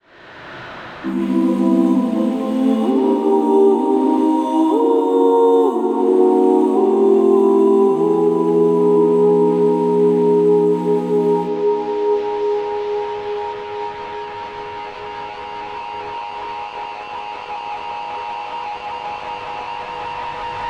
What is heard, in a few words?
nightingale wild feedback